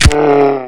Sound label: zombie hit; Hellboy